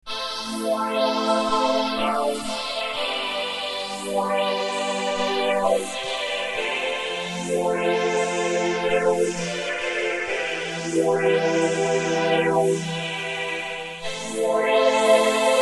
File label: All Outputs Mad Sweeps